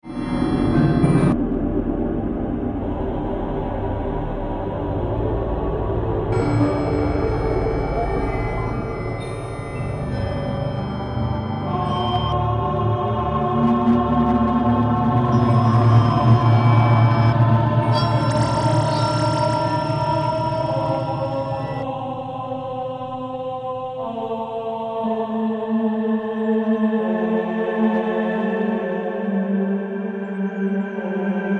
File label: SYNTH